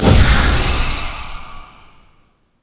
morgana q dark binding; LoL

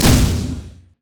dragons rage; LoL; leesin; blindmonk

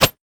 crit attack; jester

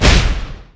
dragons descent; LoL; shyvana

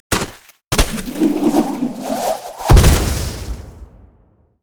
snowday emote death; LoL; ziggs snowday

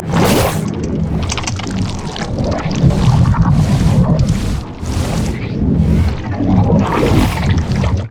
emote dance; Vel'Koz

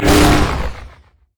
passive buff; LoL; vi

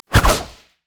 swipe; nidalee base

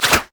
grasping roots; LoL; zyra

